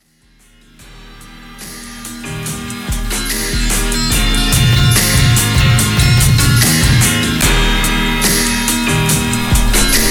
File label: Sleigh Bell